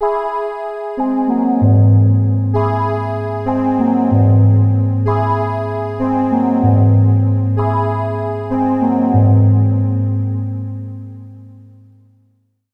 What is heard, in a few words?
tension; Rosegarden